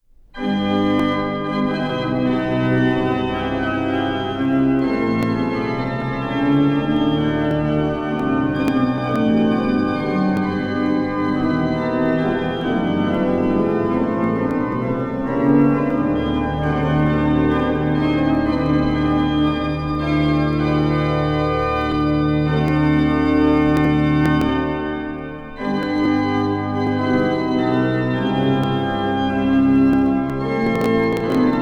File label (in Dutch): orgelsolo; Gouden harpen ruisen